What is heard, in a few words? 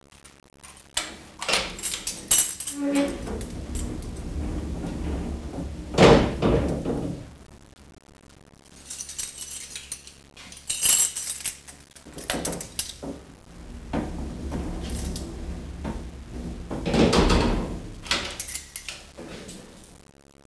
cell, police; disaster